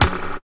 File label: combi drums